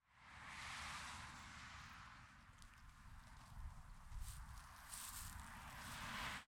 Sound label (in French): Friche Scierie Voitures Bord Route Brindille Gravier; Châtenois